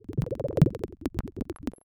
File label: bubble